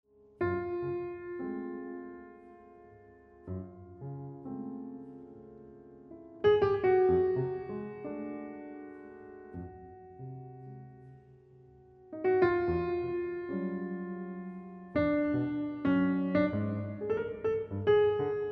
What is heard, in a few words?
dark dance